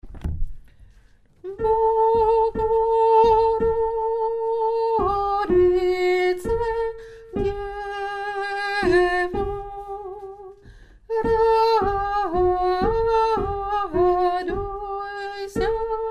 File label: bogoroditse devo soprano